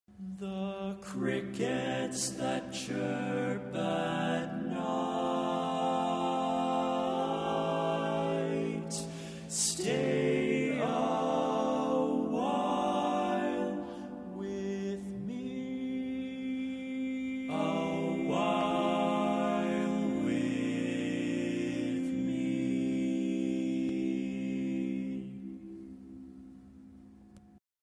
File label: Crickets at Night Bass, barbershop tag